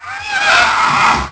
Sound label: creature; The Elder Scrolls III Morrowind GOTY; XBOX